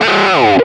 trash empty; jam; stereo

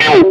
desktop e-mail; jam; stereo

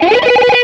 phone incoming call; jam; stereo

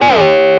button toggle off; jam; stereo

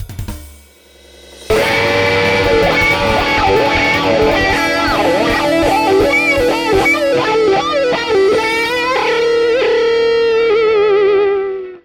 desktop login; jam; stereo

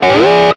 button toggle; jam; stereo

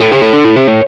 suspend error; jam; stereo

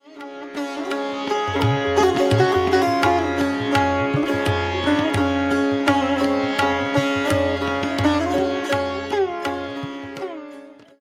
desktop login, sitar; stereo

